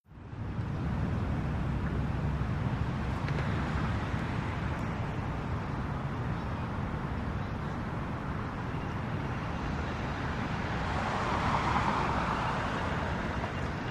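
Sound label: City Traffic No Pedestrians; big city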